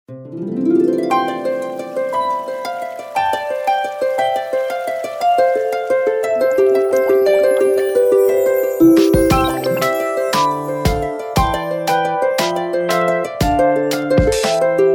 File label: ringtong; Gionee V185